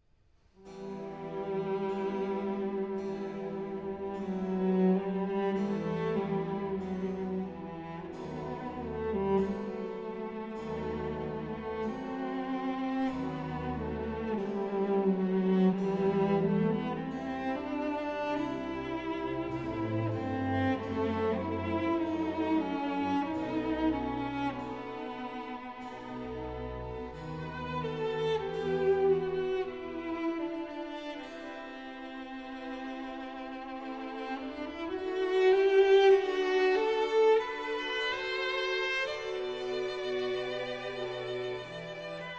Violin Concerto in G Minor Grave (Arr. For Cello and Strings)